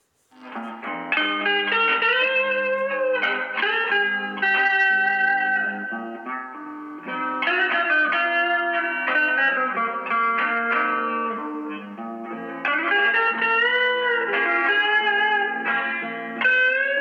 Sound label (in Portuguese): surfing bolero